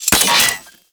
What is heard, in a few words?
Sniper Rifle Alt Mech; Warframe; Weapons; Corpus